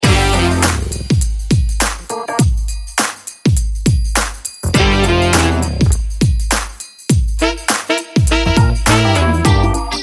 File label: Soul Rhythm